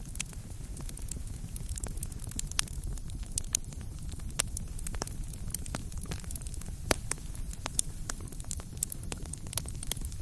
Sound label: campfire